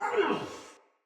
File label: Pain, Barks Battle Soldier; Sinecure Soldier